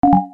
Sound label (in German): Treppe rauf